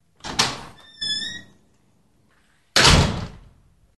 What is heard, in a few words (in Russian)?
zvuki dverei